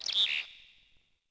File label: Forest Bird; Ambient; Civ4